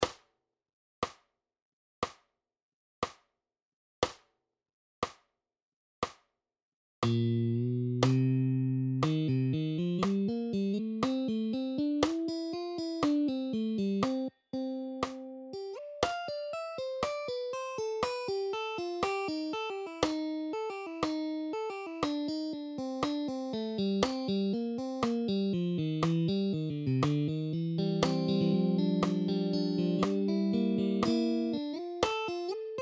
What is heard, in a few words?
Bpm 60, guitar